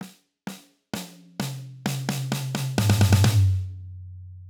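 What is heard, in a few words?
14x5 Maple Velocity Layer Toms; AHM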